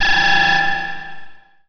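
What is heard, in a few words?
ambience signal horn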